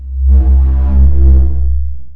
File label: boss gate